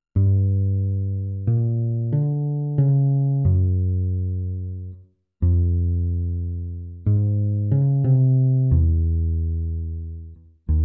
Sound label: Bass Practice; The Legend of Zelda Majora's Mask